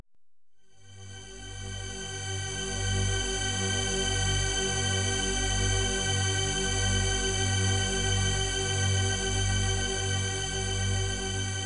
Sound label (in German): G 385Hz EIngestrichen Okt; 18 Sec. lang; Frequenzen sichtbar gemacht